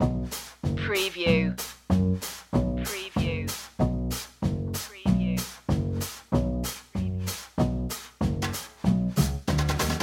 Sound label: rhinestone rambler loop